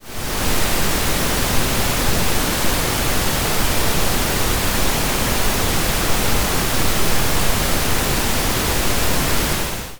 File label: rain, Gentle Rain, Nature